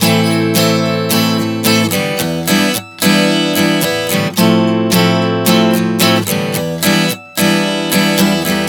Am-Em7-Fmaj7-Em7 110 bpm, acoustic guitar chord progressions